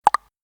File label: vk message sound